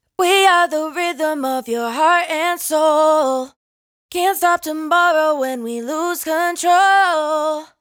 hook 122 Cm, Dry Vocals; Raw Vocals; Slate Digital Omnivox Vocal Sample Pack

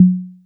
Conga Low Smooth, 808; 808 Congas; Slate; Vintage Drum Machines Volume 2 808 Edition

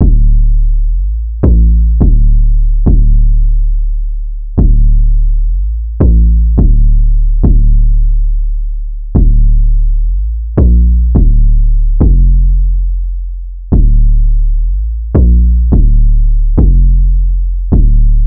808 loop 105 Dm; Slate Digital BASSHOLE Sample Pack

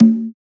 perc conga, Drum One Shots; SD MIDNIGHT Sample Pack; Slate

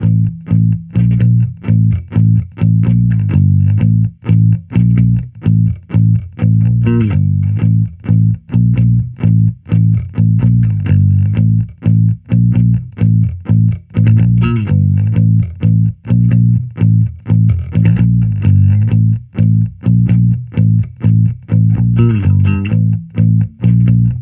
Amped loop 127 Am, Electric Bass Loops; Slate Digital BASSHOLE Sample Pack

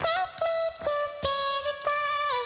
Vocal Sample Pack Phrases D; Slate Digital Omnivox Vocal Sample Pack

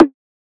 perc woop; Drum One Shots; SD MIDNIGHT Sample Pack; Slate

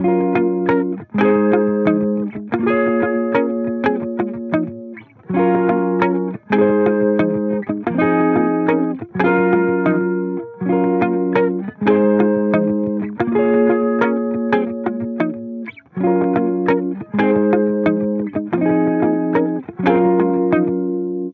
guitar loop 90 Bbm; Pop; Guitar Stacks; SD PASADENA Pop Sample Pack; Slate

